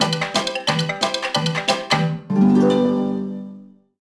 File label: BGM Jingle Win; lucky luke the daltons; nds